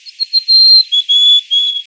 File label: song, chickadee